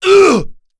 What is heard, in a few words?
Vox Damage; Bernheim; kings raid; voices heroes en